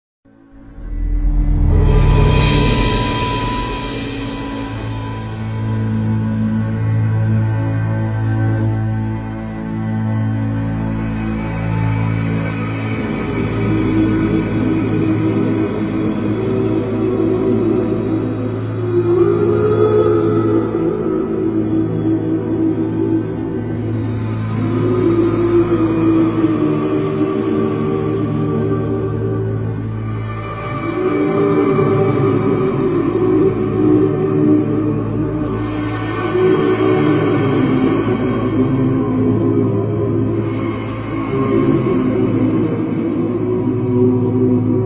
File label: bgm; Bill